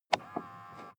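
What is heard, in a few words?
sports car interior side mirror adjust very short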